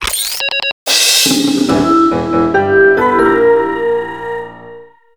quest status tick complete

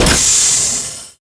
cap close start; doomsday; Garry's Mod; tf2

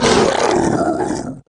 monster zombie warlord death; the monster plane; kolmods

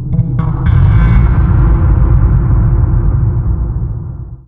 mysterious perc, halloween ambient; Garry's Mod; tf2

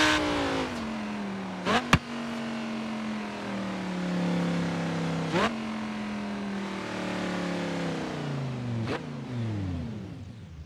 slow down, audi v10; autos; skyautos

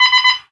apache warn rocket; cameraman3

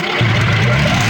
gun motor start; weapons; dragon; garrysmod; tf2

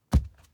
step L, deer; quitoypongo; cursed deer phase3

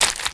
octo steps; Garry's Mod; tf2

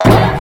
hoppy jump; critters; nightmare criters

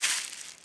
grass, footsteps; Garry's Mod; tf2

